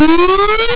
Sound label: synth origin; SCMPX (クオリティー100)